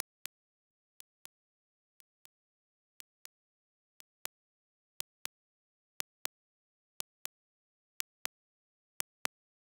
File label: soft click for a haptic